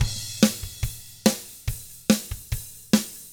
ROCK, Drums; ILIO; Double Platinum Drums 1

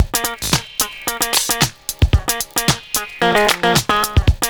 ULTRA FUNKY, LOOP; Univers Sons

